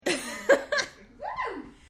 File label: Female Laughter